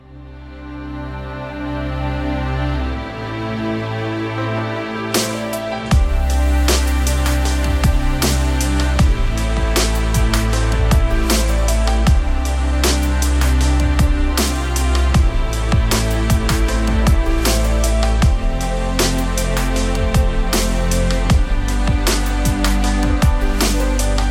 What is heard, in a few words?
Menu Music; FFXV